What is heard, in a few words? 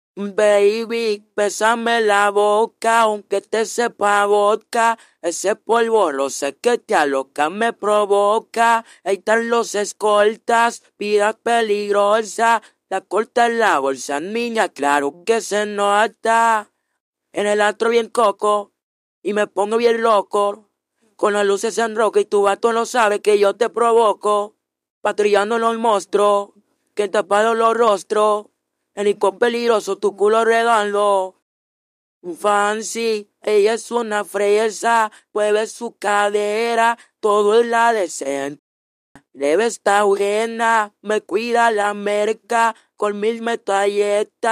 Vocals; DIABLO ARTIFICIAL VOZ; Harley-Quinn